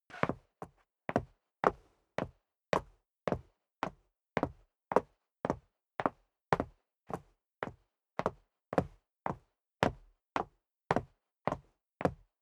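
step dress boots concrete upstairs sequence, footsteps; schritte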